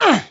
hurt light; cj

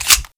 clip out; trh 92fs

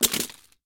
mag drop pistol; universal

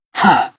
light pain; zero

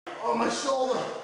hurt med; billy

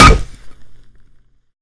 pan hit, frying pan, melee; weapons

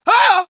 hurt heavy; cj

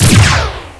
fire, weapons

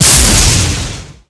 hit, weapons

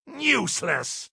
hurt light; joker

